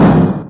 explo, video games; xevious

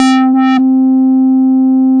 Synthesizer Middle C Notes, Lead

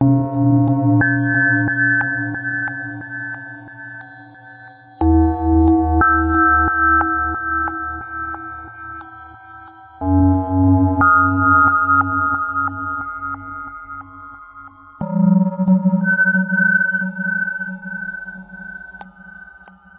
rotating bells; Studies in MASS

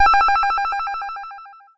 sonar; VIDEO GAMES

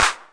clap, Roland CR-8000 drum machine

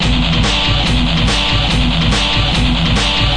heavy metal, guitar loops

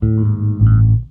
sickly bassnote; Basses

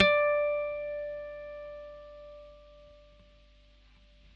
G 7 fret, acoustic guitar harmonics

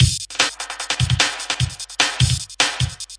150 rolling simple break, drum loops and breaks; 141 to 160 bpm

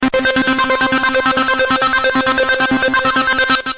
octave ringer; SYNTH AND ELECTRONIC LOOPS

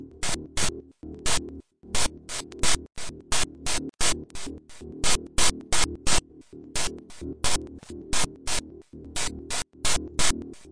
86 bpm cymbal stutter gate

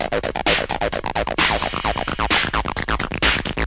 acidline with 909 set; SYNTH AND ELECTRONIC LOOPS

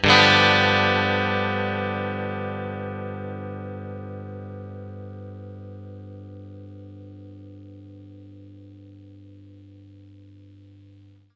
clean chord G, clean electric guitar chords

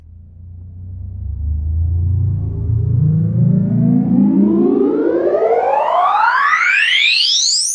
Electro FX; Ueb HE Electro FX

